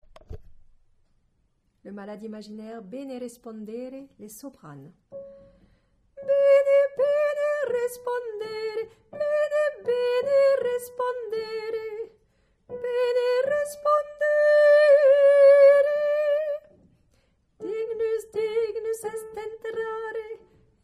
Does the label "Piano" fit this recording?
No